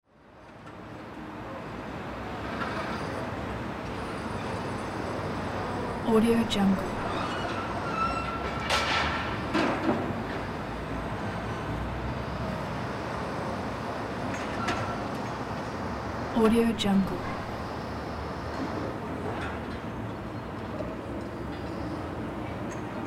busy construction site ambience

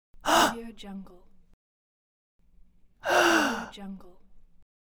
surprised male gasping

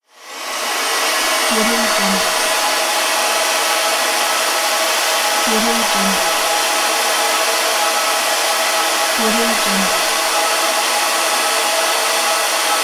sweeping vacuum; efxideas

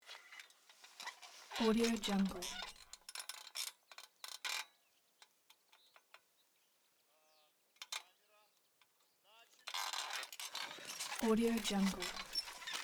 old cycle

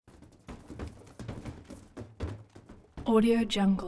balloons bump hitting together when shaken; prosoundfx

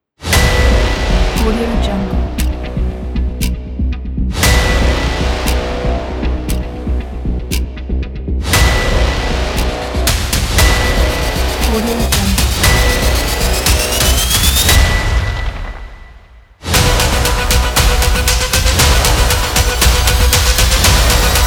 hybrid trailer ident

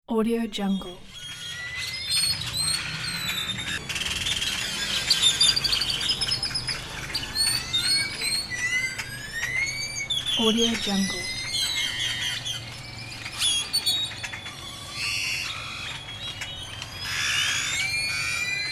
birds in garden

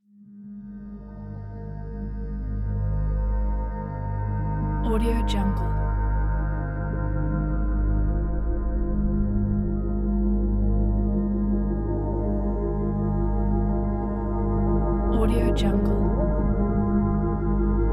binaural ambient deep meditation

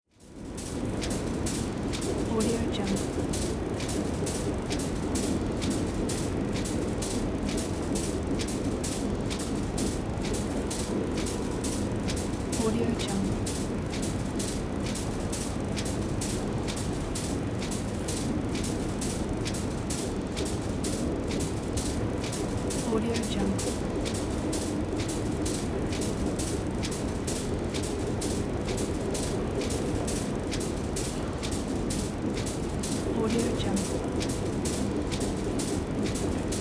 steampunk factory atmosphere

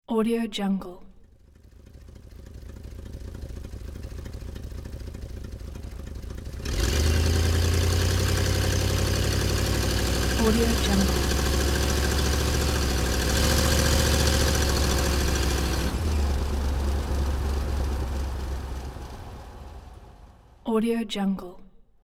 auto drive away

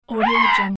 strange birdlike creatre short woo; sound ideas

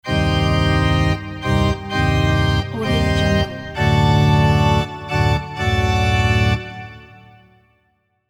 halloween organ

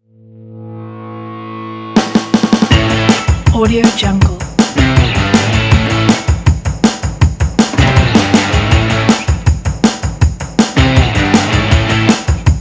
power indie rock sport

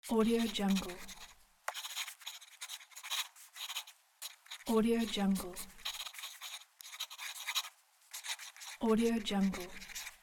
ink pen writing on paper

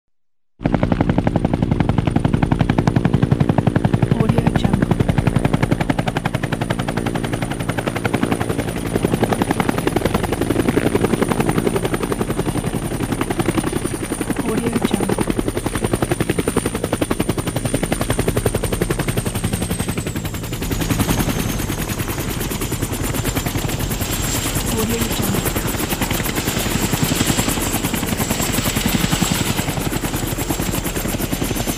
helicopter lands

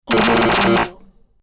8 bit electric shock

